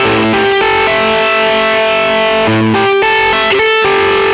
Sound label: boogie lick, Psychedelic Guitar; Loop Samples